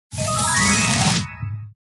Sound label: bow spawn; Blue slush cookie